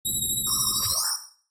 Enemy Alarm Attack

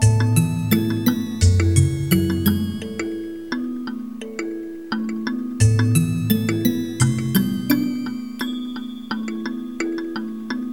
Cold Chasm, Carrying; Pikmin 2; Olimar

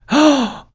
gasp; Golf Blitz 1.12; Emoji

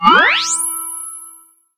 Charge; Chaser

